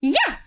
punch yah; birdo; SM64 CO-OP

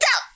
doh; birdo; SM64 CO-OP

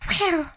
snoring; birdo; SM64 CO-OP

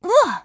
ground pound wah; pauline; SM64 CO-OP

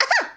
coughing; birdo; SM64 CO-OP

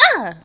uh; toadette; SM64 CO-OP

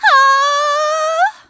on fire; pauline; SM64 CO-OP

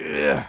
"eeuh"; foreman spike; SM64 CO-OP

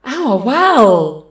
on fire; rosalina; SM64 CO-OP

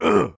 attacked; foreman spike; SM64 CO-OP

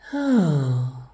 snoring; rosalina; SM64 CO-OP

